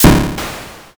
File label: thunder magic